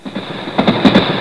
train

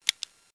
button Click; blobAndConquer-0.91

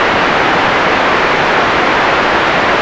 snow drag; linux-virtual-jay-peak; soulride

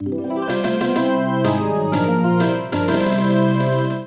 Victory; eboard

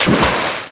water In; blobAndConquer; combat

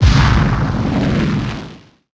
fire explosion; weapons; ufoai

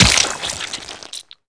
splat; kimboot

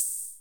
flip piece; concentration

